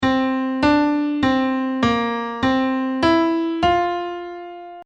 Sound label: Playing in F; piano quiz